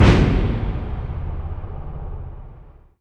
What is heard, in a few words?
Impact Boom Crash; bottlerocket